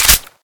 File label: vp pistol slide; weapons; deluge